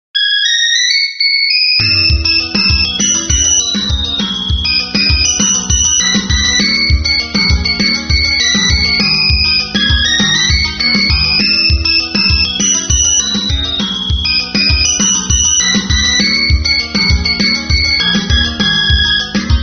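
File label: Nokia Polyphonic Ringtone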